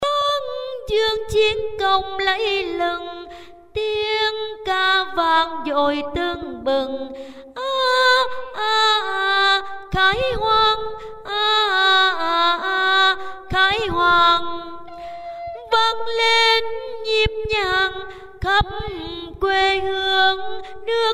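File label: tenor low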